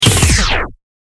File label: shoot rocket